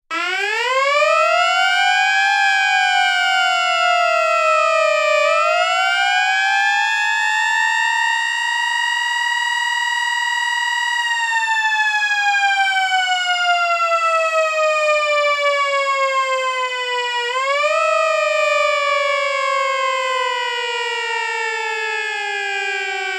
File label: dual manual mechanical, siren; sa441M